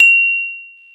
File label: BEL CELES; Roland - LA Composer vol. 1